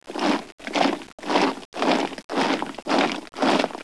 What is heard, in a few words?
FORT; Caesar III